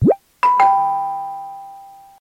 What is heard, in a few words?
Ding